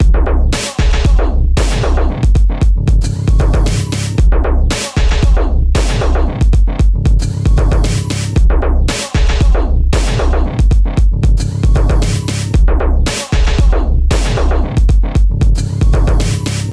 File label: Beat